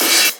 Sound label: hihat real